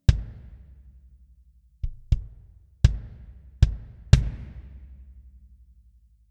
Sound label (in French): Grosse caisse